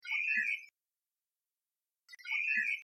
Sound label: oriole call